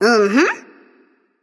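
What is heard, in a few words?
nonverbal agree; smite; ratatoskr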